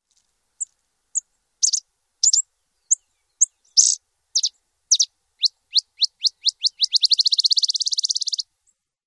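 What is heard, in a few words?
Botteri's Sparrow calls ending with trilled song; AZ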